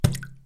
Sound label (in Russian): voda kaplya udar silnij blizkij